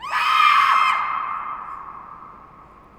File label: Fieldhouse Scream